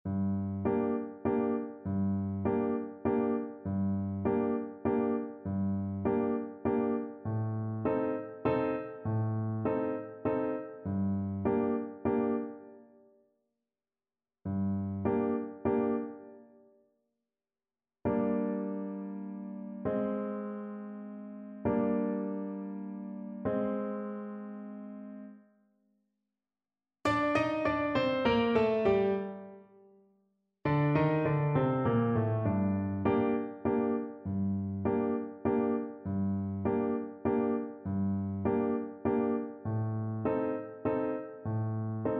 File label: creak crunch scream, Tenor Saxophone